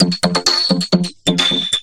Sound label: Vocobeat; Sonic Foundry (Sony Creative Software)